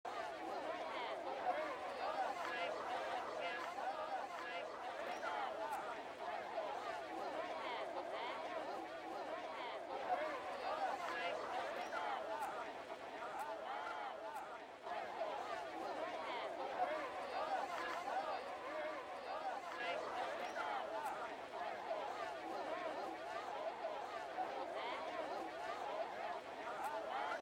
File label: ambient chatter loop